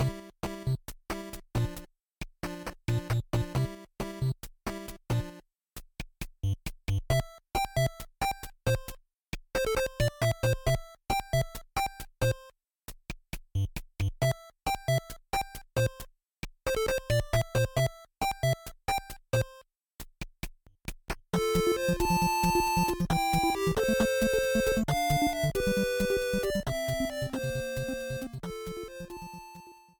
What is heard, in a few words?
Title Theme NES; SMM2